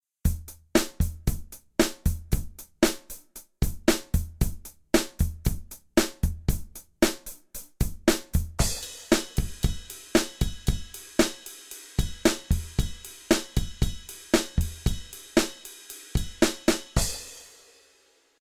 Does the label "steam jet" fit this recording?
No